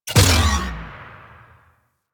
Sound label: Cart Turret Energy Ball Shot; Warframe; Venus; Venus Turrets; Venus Cart Turret Energy Ball Agent